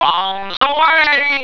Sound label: Bomb Away; Game Audio Samples